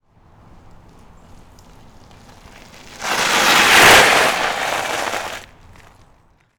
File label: Bicycle, Approach, Gravel, Fast, Speed, Super, Skid; Rzpost; Ride on Bike